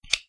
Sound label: single bullet